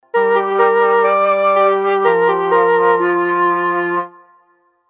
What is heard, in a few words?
Mellotron Flute Clean